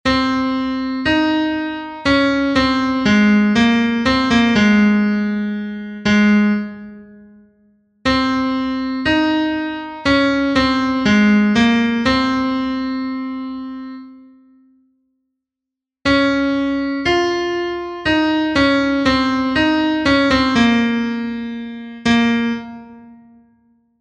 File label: generated melody